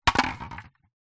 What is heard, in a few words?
vehicles bicycle helmet plastic set down drop ground